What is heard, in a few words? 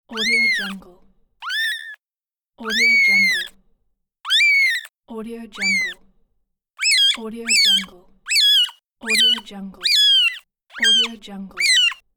kitten